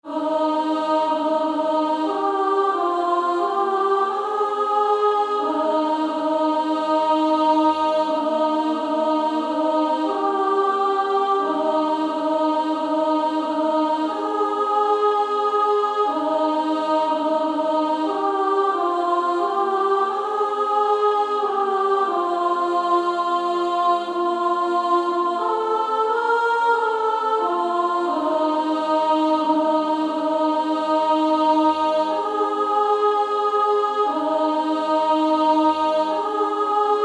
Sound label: Female Choir